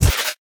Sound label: Activate; Smite; god Izanami